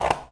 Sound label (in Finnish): sakset, Clangy Crunchy Foundsound Kit